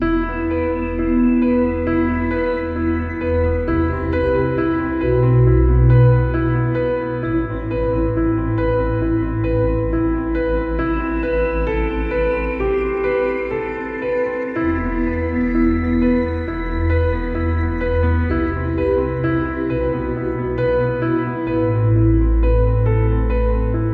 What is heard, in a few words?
Piano